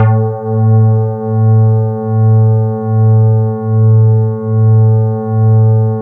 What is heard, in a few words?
E-HARP, DX HARP; Wizoo - Powered DX